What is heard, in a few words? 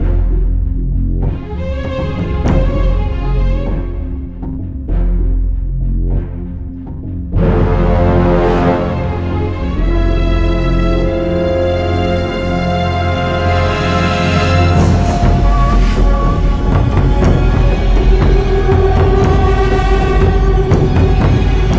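Low Combat loop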